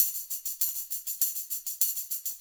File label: Tambourine, acoustic percussion loop; Drums & Percussion; Percussion Loops